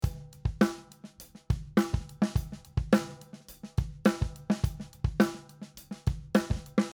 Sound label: Snare phase inverted